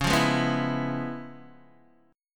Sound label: Db 7th Sharp 9th, Guitar, DADGAD; strummed; x,4,3,1,2,2; JGuitar